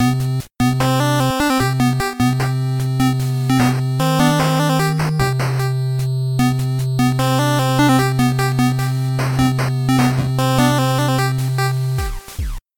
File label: game over